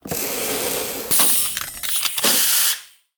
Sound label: purify, trash collection